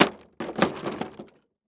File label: Impact Wood Crash